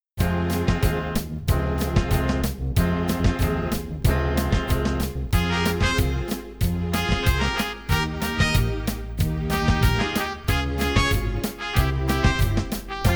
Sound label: samba, guitar performance